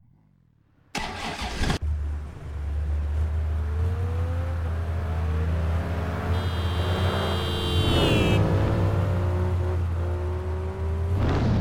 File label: shelby mustang gt500 svt 2010; TDU-1